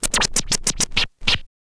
scratch short, Vinyl scratches

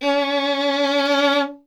Vln Detache, Solo Instruments; Miroslav Vitous

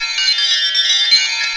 AMBI PAD

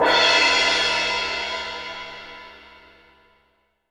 16, MALLET CYMBL

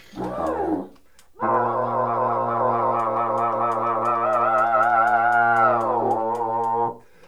GROWL WIND; E-MU Formula 4000 Series Vol. 1; Hip Hop Nation; Booty Brass

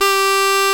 CHANTER G1, Exotic Winds

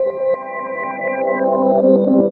Backward, Ambient; Zero-G Jungle Warfare 1, 2 & 3; 90 s Sample CDs

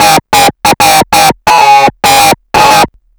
dist loop; DISTLOOP KIT; AKAI

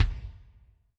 Lo Kik Rm, Alesis SR16

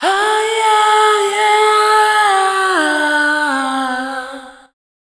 FEMALE, Dance Vocals

